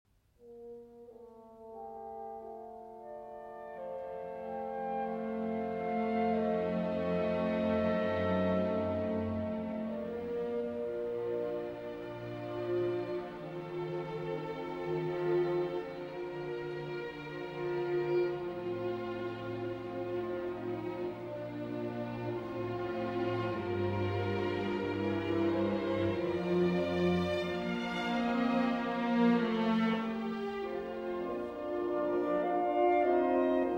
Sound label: Symphony in D Minor, Choral